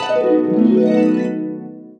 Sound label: Payoff